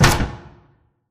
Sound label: Plunger Tube Open Start; Titanfall 2; Boomtown